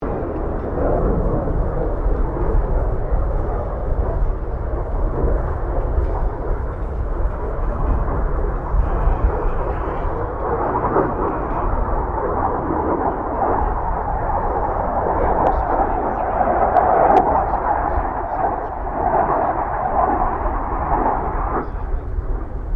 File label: AIRCRAFT FA-18 HORNET PASS DISTANT